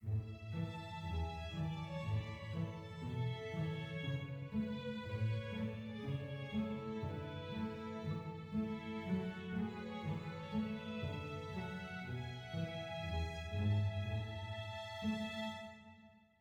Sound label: happy soft; Quivr; PS4 Windows gamerip 2016